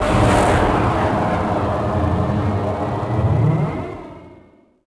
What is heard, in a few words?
Fighter thrusters; vehicles; naboo